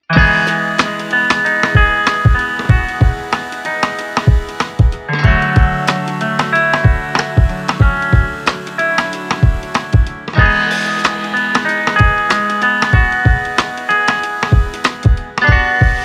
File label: Chill BPM 94